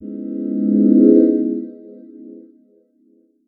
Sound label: Optics Pad 125